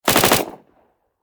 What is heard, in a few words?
Warfare Submachine Gun Thompson 9mm Short Burst Distant Perspective; Machine Guns